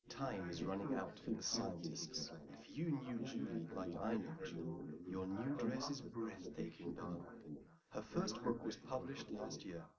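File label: male, live recording 250ms 5cm; SiSEC11; underdetermined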